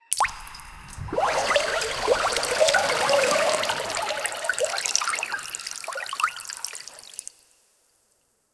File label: elemental water strike